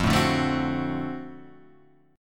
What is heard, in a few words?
D 7th F, guitar; D7/F strummed; JGuitar